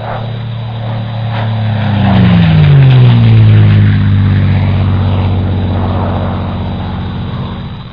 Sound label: P-51 Mustang